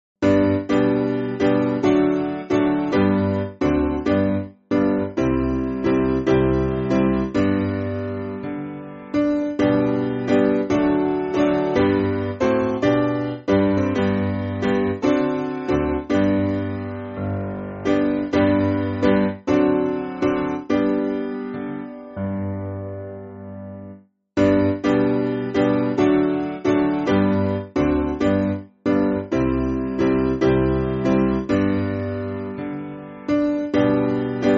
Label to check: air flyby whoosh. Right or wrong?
Wrong